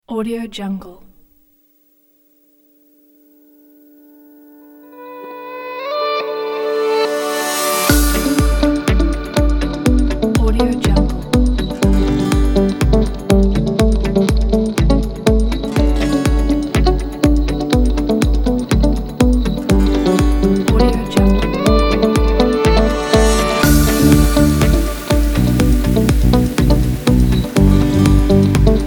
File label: happy corporate kit